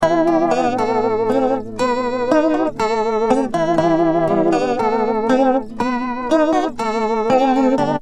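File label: acoustic guitar Voce spin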